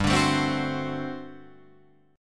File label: G Major 7th Suspended 2nd Suspended 4th G down Guitar Open F; GM7sus2sus4 strummed